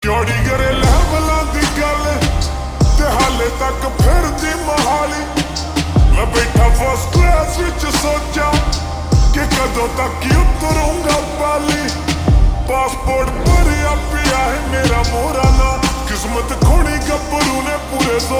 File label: Ringtone slowed and reverb